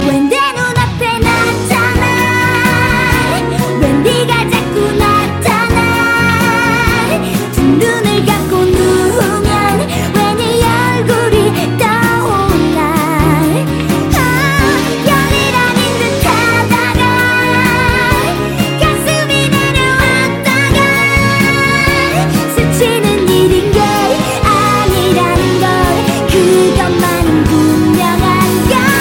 Female Version

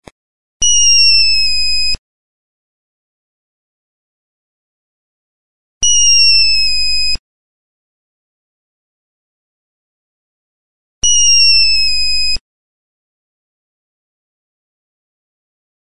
Rocket

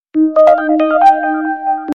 Nokia E75 Sms; New Nokia E75

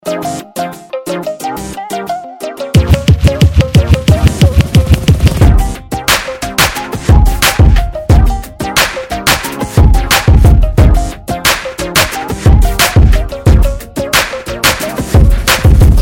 Hip Hop Tallava

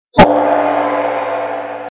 Gong

